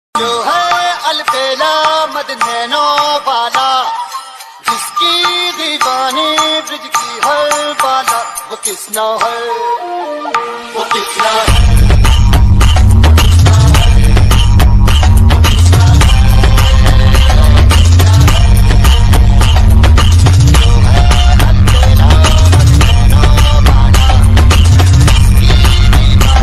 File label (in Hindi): bhakti ringtone; Janmashtami special ringtone